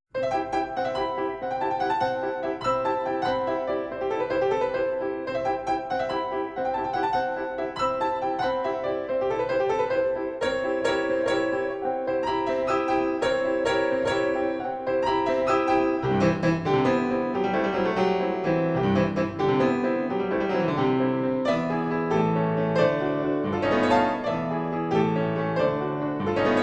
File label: Piano Music, Valse, Assez vif; Francis Poulenc; Piano Music Volume 1